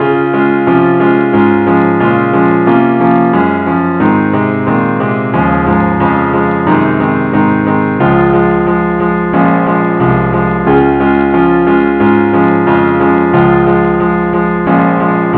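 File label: keyboard only, key d flat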